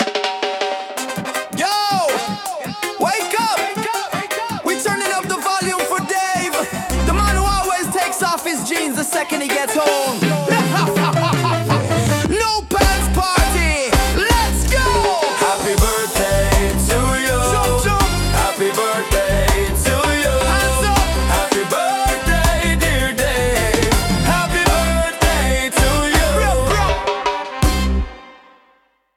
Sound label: reggae; birthday song